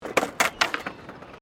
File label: SKATEBOARD FLAT DROP; Skateboarding; Sports